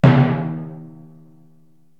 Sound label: Timpany, Korg TR-Rack